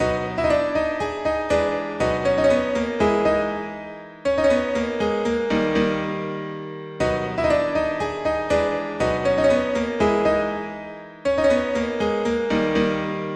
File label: Free Classical Music Prelude; Piotr Ilitch Tchaikovski